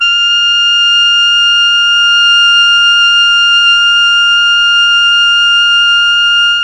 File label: F4 alto sax, mellotron; CHMB ALTOSAX